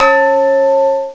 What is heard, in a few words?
CHIMES, MALLETS; CMI Sound Library Rev 1.3